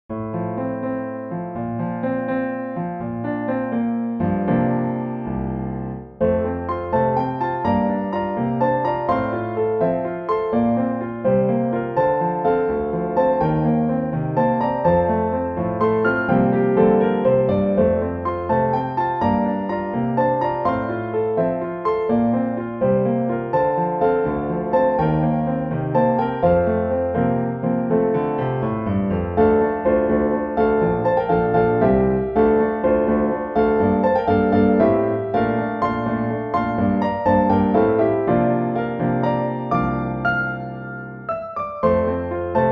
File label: Piano Duet